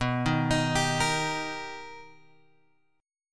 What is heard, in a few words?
B Augmented Major 7th B, chord; B+M7 Chord {7 6 X 8 8 6} arpeggiated